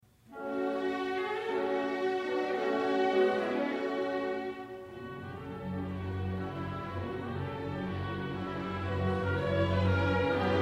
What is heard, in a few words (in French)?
Concerto pour violoncelle en si mineur; dvorak; postromantisme